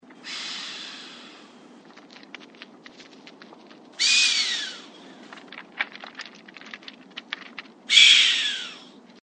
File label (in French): chamois